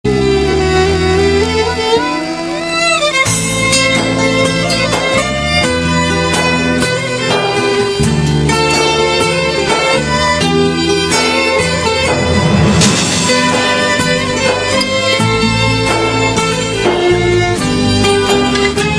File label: Turkey Ringtone